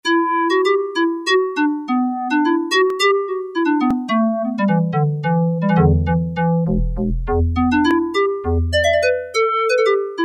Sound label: Perc Flute, Yamaha TX81Z